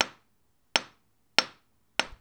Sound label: hammer Rock; tools Bangs